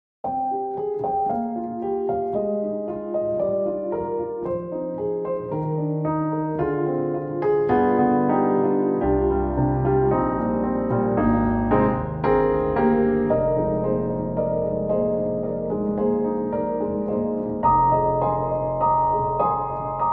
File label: Grand Rhapsody Piano Warm and Emotional; Waves